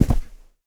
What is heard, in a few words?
object fall impact